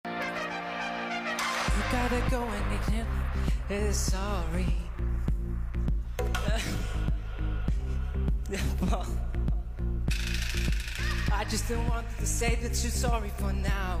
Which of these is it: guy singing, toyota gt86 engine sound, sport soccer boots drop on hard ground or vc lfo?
guy singing